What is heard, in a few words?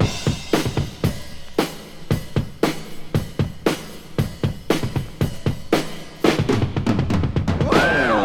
116 bpm breakbeat b key